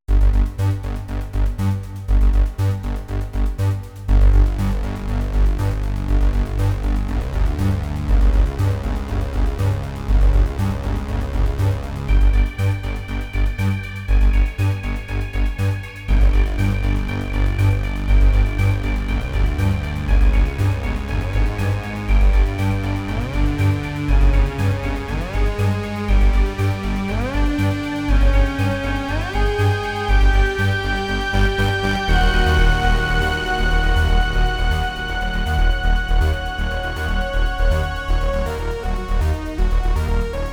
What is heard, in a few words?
synth scifi horror classical; Berklee Online Assignments